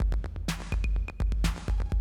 125, Classic Drum Loops; Best Service; XXL 1500 Classic DrumLoops; Sample CDs

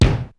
DR550 ROOM K; 90 sSampleCDs; Crypton - DrumBase5000

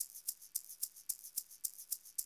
110, Classic Drum Loops

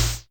Tekk, Electric Snare, Yamaha DTXpress

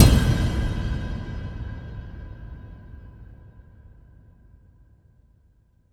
METALICOUS; Spectrasonic Distorted Reality 1

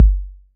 BD 808

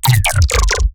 glitch stab; Virtual Riot; Glitch Stabs

